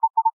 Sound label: Battery swelling warning, ui; Galaxy Note 9